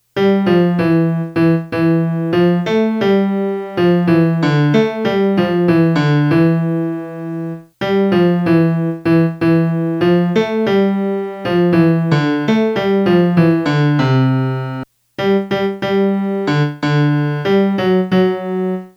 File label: melody